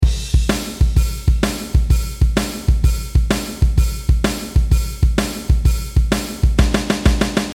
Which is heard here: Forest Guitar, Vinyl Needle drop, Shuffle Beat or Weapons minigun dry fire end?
Shuffle Beat